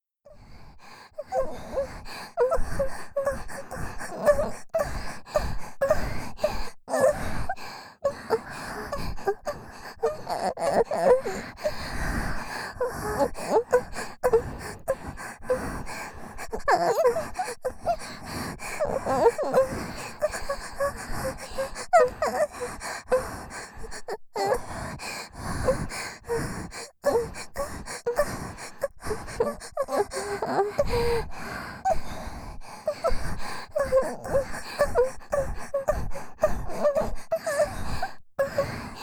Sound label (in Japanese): ひたすら喘ぎ声ループ